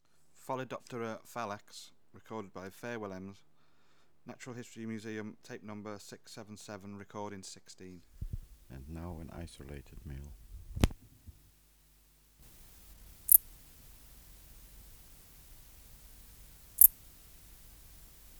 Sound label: Pholidoptera fallax